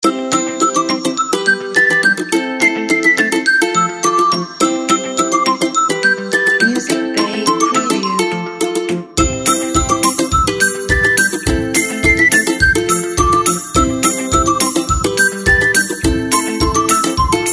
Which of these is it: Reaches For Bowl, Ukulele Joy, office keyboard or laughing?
Ukulele Joy